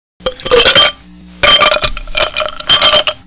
ice cubes

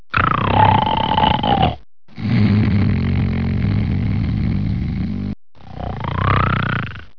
snore

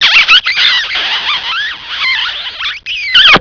Kisssss

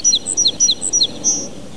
Bird Chat

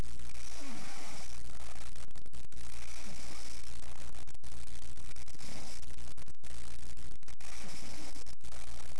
lil snore

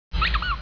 squeeze

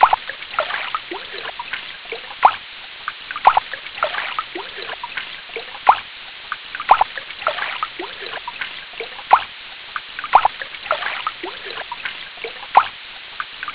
water flow

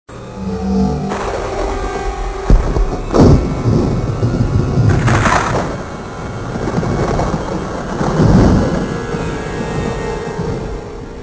desert

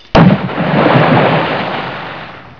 splash

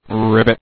rrrbit

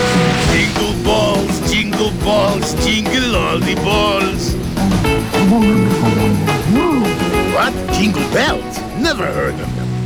jingle

